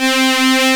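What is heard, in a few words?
analog strings C4, analog synth; AKAI S6000 CD-ROM - Volume 1; SAMPLE WAVE MIXING 1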